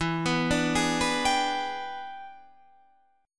E 7th Sharp 9th Guitar Half Step Down, chord

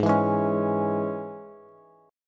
Ab Suspended 4th Sharp 5th Bass; strummed; JGuitar

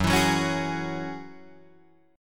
Gb 7th Suspended 2nd Gb Guitar Drop D

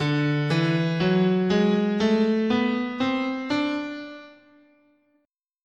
D Lydian Dominant, guitar, scale

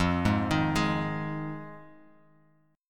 F Minor 7th; down; chord shape

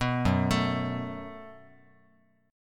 F sharp Minor Double Flat 5th F sharp, Guitar; arpeggiated

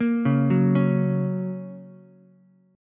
A sharp Minor Sharp 5th, Ukulele; 3,1,2,4; arpeggiated; JGuitar

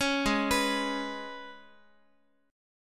G sharp Minor Double Flat 5th G sharp, Guitar; G#mbb5; arpeggiated; JGuitar

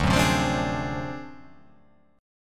D 7th Sharp 9th Flat 5th D down, chord

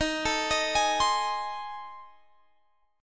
A Minor Major 7th Flat 5th Eb

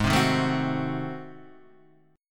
Ab 7th Flat 9th Guitar Standard, chord; Ab7b9 strummed; JGuitar

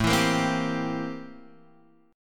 C 13th A; strummed

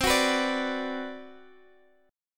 B Minor C; Bm/C strummed; JGuitar

